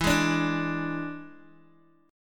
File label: E 7th E down Guitar Open G